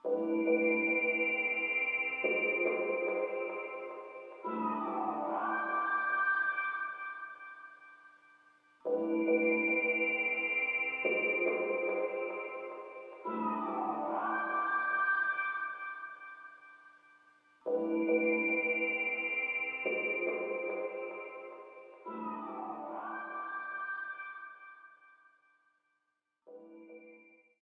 BGM Plaza Shops Mission Gate; Alterna

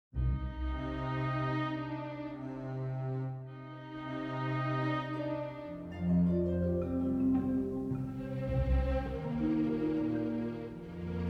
World Music

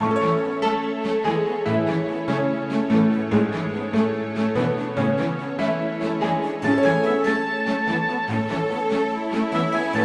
Lobby

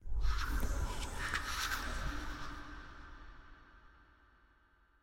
Hotel Whisper; silent hill homecoming; ps3

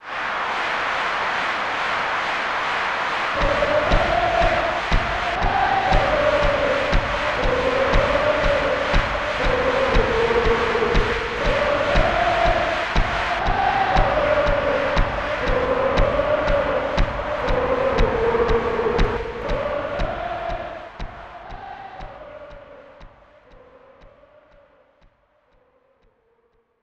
Soccer Game Ambience

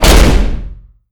Door Slam Open; Welcome to the Game

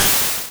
Sand Attack; Pokemon; Gen 1; attack moves; RBY

